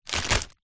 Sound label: foley packet plastic crisps multipack empty set down surface